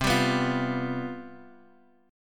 C Major 7th Suspended 4th, down; strummed

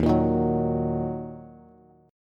Eb Minor, Bass; Ebm strummed; JGuitar

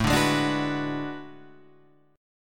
B Minor 6th A; Bm6/A strummed; JGuitar

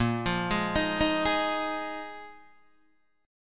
A sharp 7th Suspended 4th down Guitar DADGAD

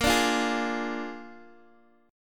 A sharp 7th A sharp, Guitar DADGAD; x,x,8,7,8,6

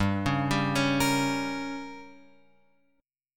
G Diminished; Gdim Chord {3 4 5 6 X 6} arpeggiated

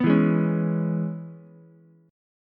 C sharp Minor 7th C sharp Ukulele; C#m7; strummed; JGuitar

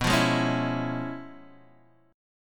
C sharp 7th Sharp 9th, chord

